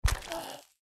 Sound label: feral ghoul foot; ghoulferal